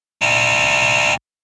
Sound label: warn